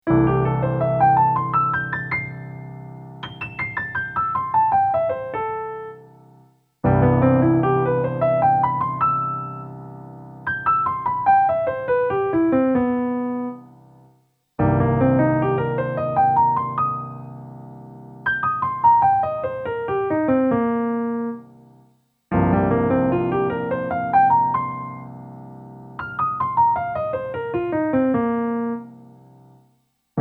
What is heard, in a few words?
6th 7th chords Arpeggios Sustained Chord